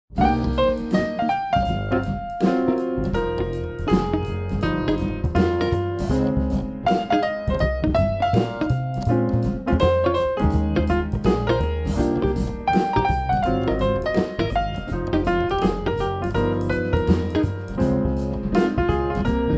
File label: light jazz